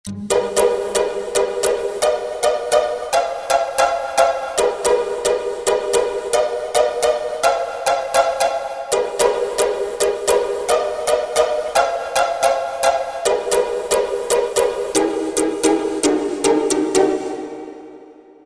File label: Sleepless, Roland SR-JV80-11 Techno Collection